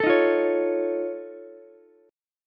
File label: F Augmented 7th F Ukulele, chord; F+7 strummed; JGuitar